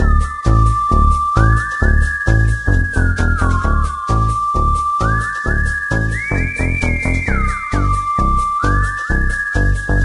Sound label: Spaceship Music; Diner Dash: Flo on the Go; NDS